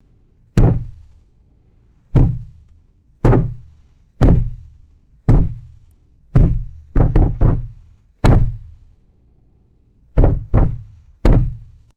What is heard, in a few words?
heavy thudding impacts on wooden wall